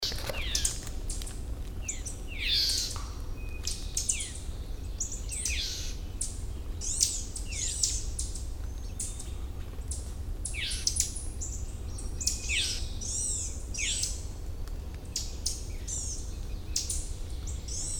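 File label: Red-eyed Vireo Scolding, Millington, Maryland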